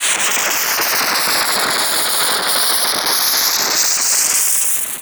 Static; Blastwave

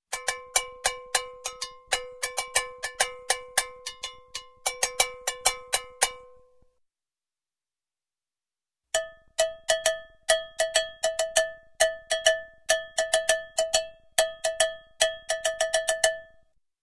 Cowbell rhythm, Music, Percussion; Sound Ideas; Series 2000

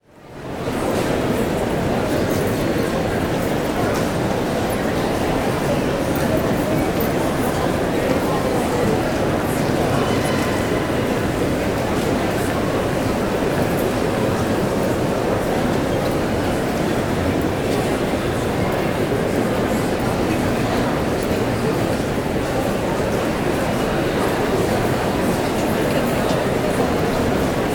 Mall Busy Open, Walla; Sound Ideas; Walla Series

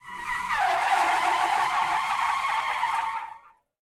skid asphalt; Blind Drive

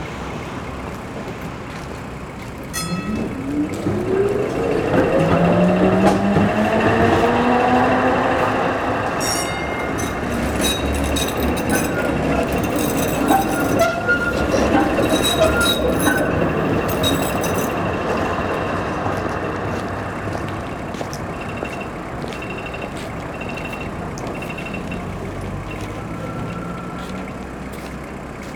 tram ambi; best service Blue Box

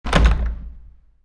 dungeon entrance closed; DeadFright

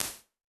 2000 SN SHORT, Impulse Responses; Reverbs; PastToFutureReverbs; SONY; CLA; IRs

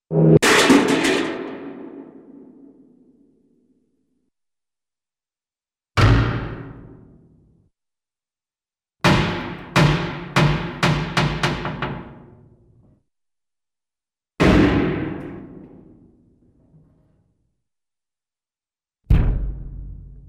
Metallic Object Falls, cartoon; Hollywood Edge; Cartoon Trax

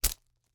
slingshot, dry firing, slap, rubber, rustle, shot

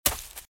Footsteps - Leaves - Run - Left; RadwinIsland

